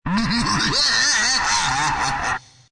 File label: Laugh Evil Toybox, Male laugh; Laughter; VoiceBox